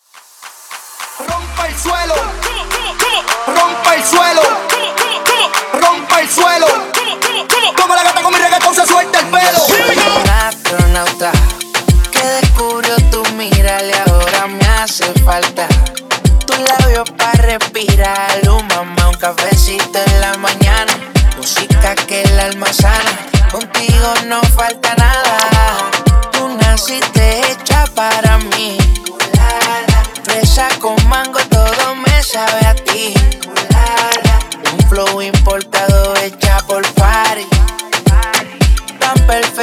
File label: Mashup Latin Moombah Trans 105 110 BPM